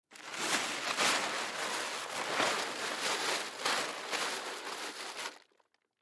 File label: Tarp Plastic Spread Out Pick Up Crumble Fast; Industry; Blastwave